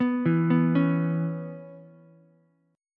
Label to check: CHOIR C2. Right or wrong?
Wrong